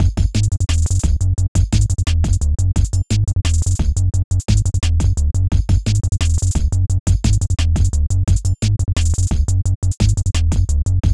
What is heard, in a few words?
drum n bass